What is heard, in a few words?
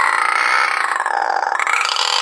throat loop, fast zombie; hl2